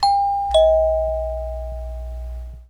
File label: chime; signal